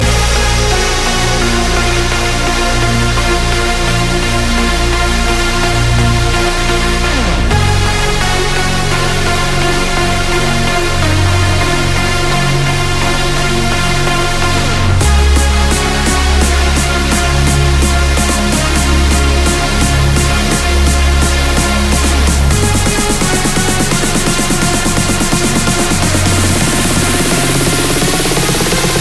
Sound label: Trance